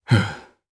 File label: sigh; DarkKasel; kingsraid